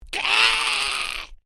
Created Creature Small Cry, Alien Sound Effects

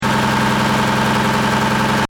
Engine: Medium Revs Loop, car; Diesel; Cars; Vehicles; Stuart Duffield

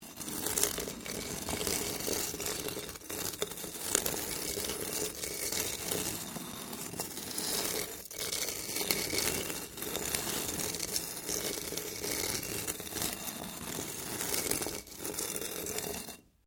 Stone On Stone Small Scrape; Material components for constructing more complex sounds; Scrapes

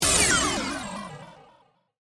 Laser Warble; Lasers

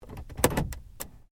Stem Control, car; Hyundai Accent 2008; Hyundai hire car; Vehicles

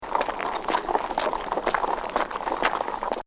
Horses Walking On Concrete; Animals; Bobby Cole

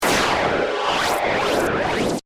Sonar Hit Phase, Sonar, Ping, Space; Sci-Fi; Sound Effects:Science Fiction; BlastWave

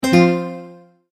multimedia tone error; Tones; Sound Design; McKinney Sound

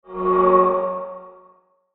Whoosh Metallic Ring Airy; Blastwave

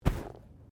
Body movement Fall Slide Light; Body movement foley to simulate falls and deaths; Wrestling And Struggling

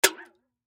Aluminum Can Drop, Aluminum Can Drop Liquid; Drops; Foley; Objects; Blastwave

